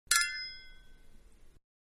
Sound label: Glasses Clinking Softer; Clinks; Objects; Manhattan Production Music